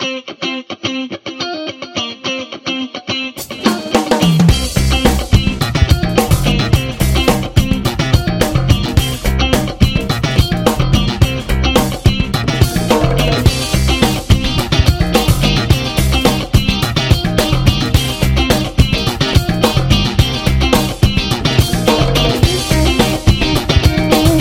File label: Funk Background; casino